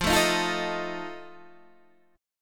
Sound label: F 7th F down Guitar Standard; F7 strummed